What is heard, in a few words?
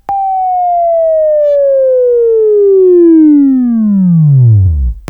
Buzz; Labview-Program; Sweeping Training program